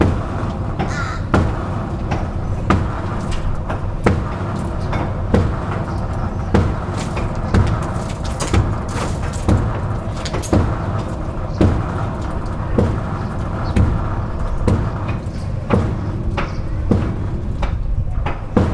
Construction Wall Slams

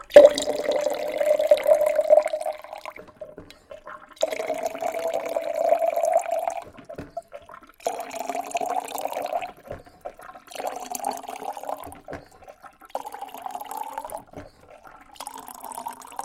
Water PourBottle

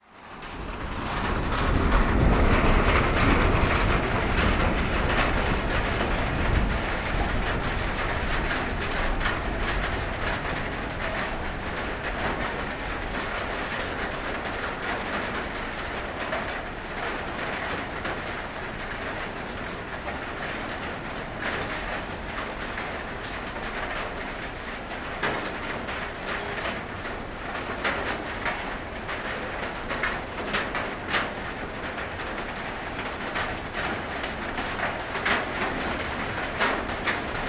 Rain And Hail; change in the weather

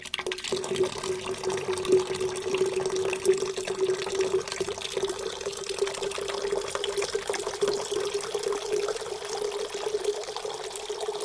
Water Fill Boiler

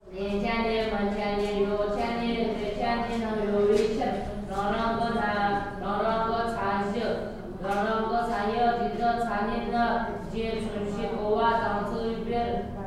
Chanting; four against three

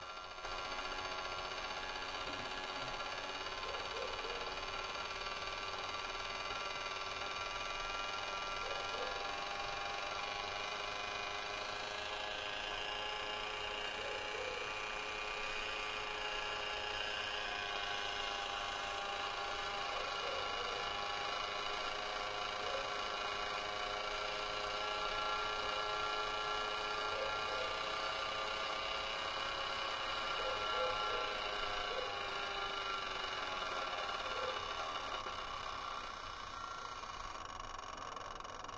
Water Boiler

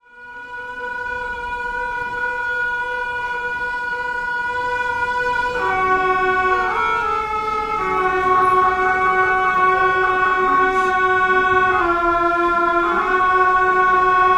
Monastery Horn Practice; long life

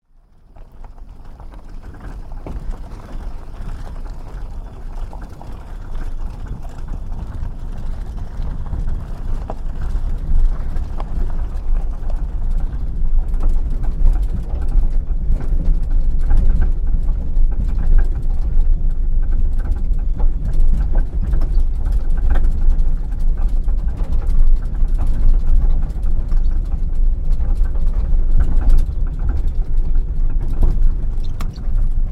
Drive Downhill; Triund; triund descent

